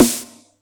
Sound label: Yamaha EX-5